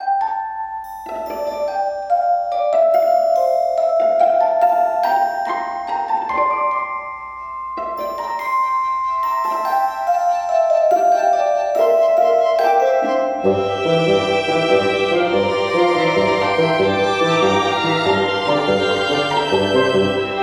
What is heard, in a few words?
Careless Underscore, Classical Quirky